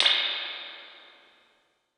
jazz chorus sm7; IRs

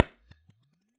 Science 2x15 3015 RE20 Port, Bass Cab IRs

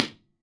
vox intern bright, AC30, IRs

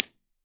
vox intern bright, AC30, IRs

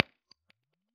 Science 2x15 15PR400 MD421 2IN, Bass Cab IRs

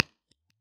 Science 6x10 SC10 SM57 1in, Bass Cab IRs

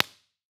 ampeg combo 421; IRs

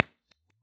2x15 3015 MD421 3in; Bass Cab IRs

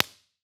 ampeg combo; IRs

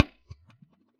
Science 2x15 15PR400 MD421 Port, Bass Cab IRs

